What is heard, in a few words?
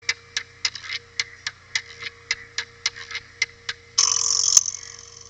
Suspicious Ton